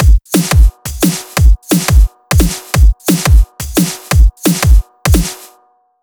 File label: 175 Drum Loop